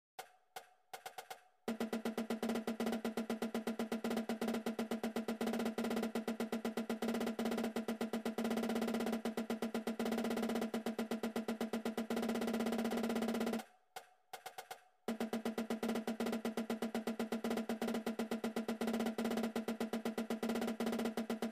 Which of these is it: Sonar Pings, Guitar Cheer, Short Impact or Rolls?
Rolls